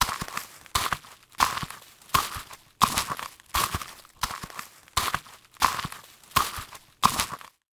Foley Footstep High Heels Leaves And Twigs Debris Walking Thick; Foley Footsteps